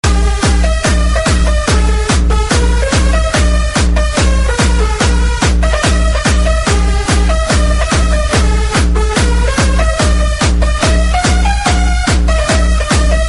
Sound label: Jumpstyle